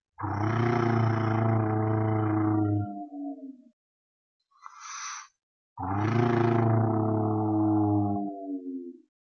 Animal Domestic animals pets Dog Growling, vocal imitation